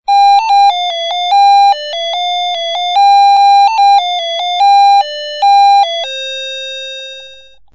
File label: melody